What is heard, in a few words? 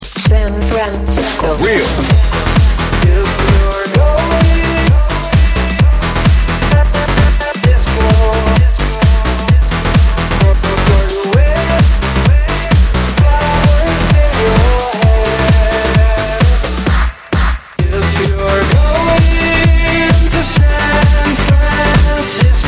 electro; san francisco